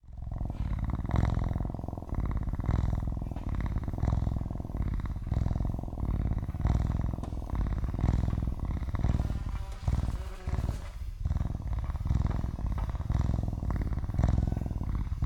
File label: cougar cub purring